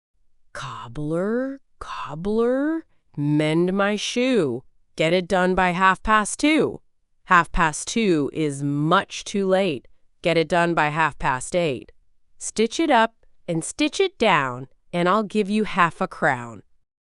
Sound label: Cobbler Cobbler Mend My Shoe fem us